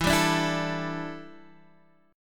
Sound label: D Suspended 2nd Suspended 4th E